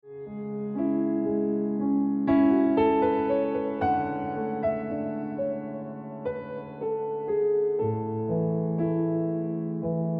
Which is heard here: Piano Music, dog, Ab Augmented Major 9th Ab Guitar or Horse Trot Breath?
Piano Music